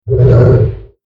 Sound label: Monster Growl; horror sfx vol 1